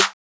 Open Snares

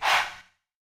Chant, Vox

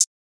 Hihat, Closed Hats

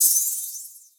Open Hat

